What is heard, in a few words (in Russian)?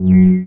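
кнопка цифровой вибрирующий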